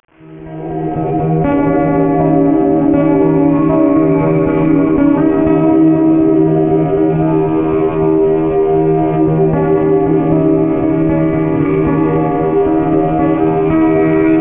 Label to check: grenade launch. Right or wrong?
Wrong